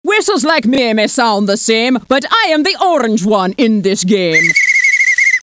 whis; Lost & Found - Volume 1; OmniMedia; puzzles

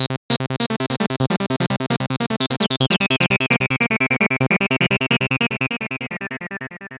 KEY, 150BPM

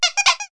toy horn; HOUSE; FUN

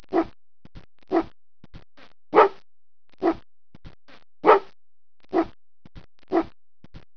fight

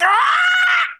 buzzard

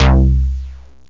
sq-sound, keyboards; Zoom - Release 2; Active Software

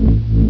Death ray; Bedlam 2 1.1.1; Bedlam Sounds

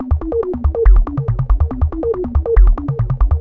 synth; flash tutorial

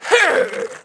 frost alf male hit; DAoC

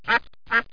ducks; Tempra Access; vision

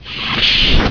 arm Swap; PC Gamer IT CD 48; Big bang

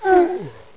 CAVE DAM; lands

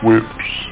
whips

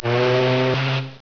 SHIP; SimCity2000™ Interactive Demo

